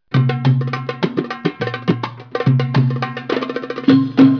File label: drum